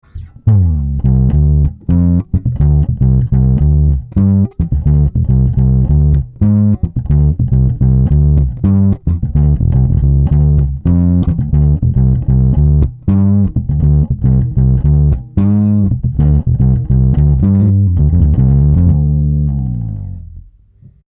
MiniMax TransTube

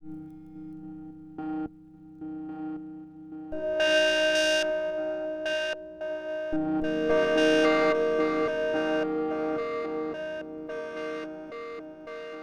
FM Pad w SH Filter Delay Chorus; Freds Lab Töörö

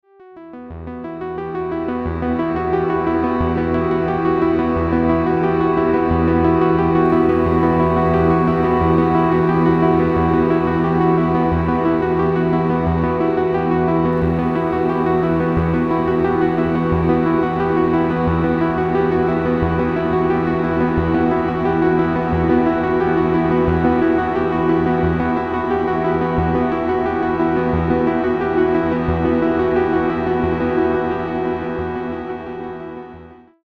Juno 60 Arp INFINITE REVERB; LXP-5

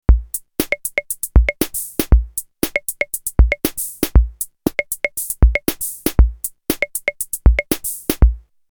EMW T-Drum 70 Kit

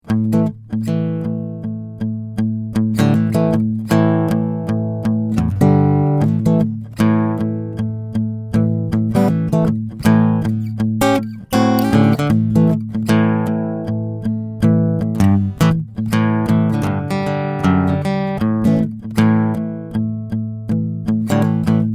Blues pattern cool Tube

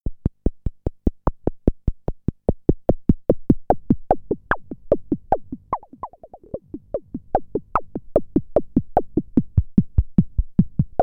Peavey Spectrum Analog Filter Reso Percussion

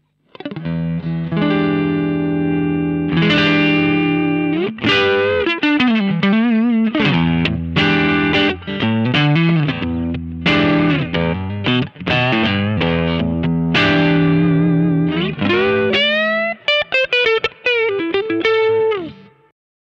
Clean Channel Cranked up

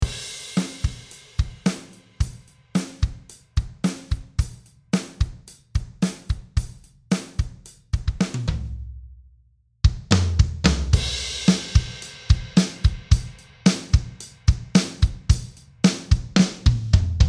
Drum Presets; IK Multimedia Mixbox

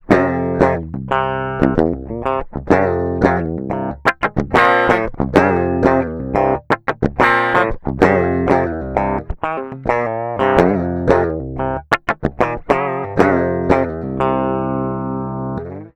dry git

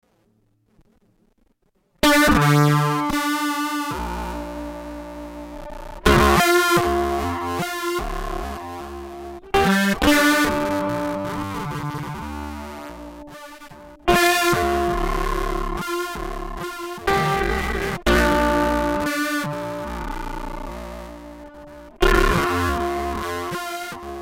colour theory PWM

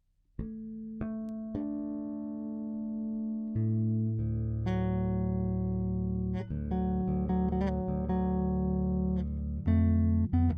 Bridge Active Chords

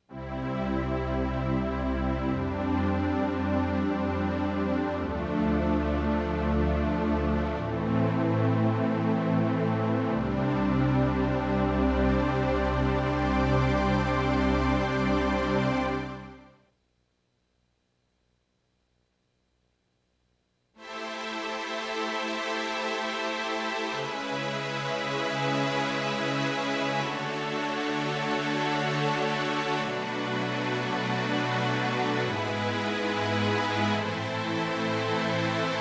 Strings

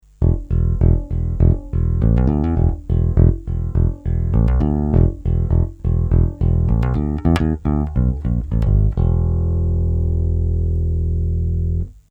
Ampeg SVT Ch1 Bright

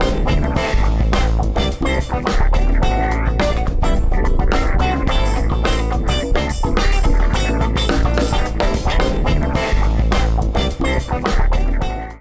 Dave Hill Designs Titan LIMITER

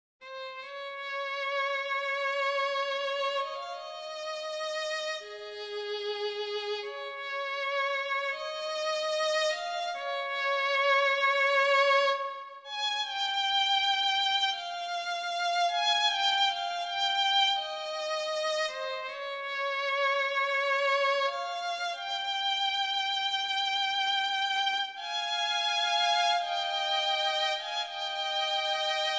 violin wet; ik phil

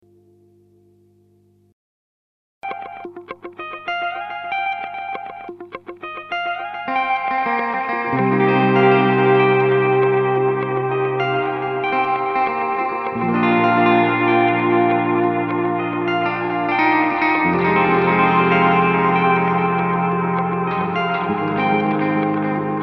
Nux NDD 7 Tape Echo Looper

